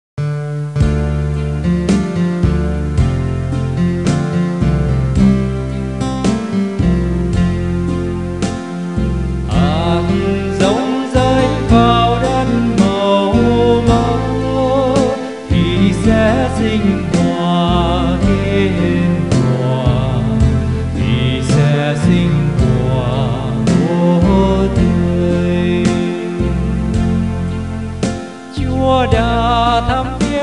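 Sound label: soprano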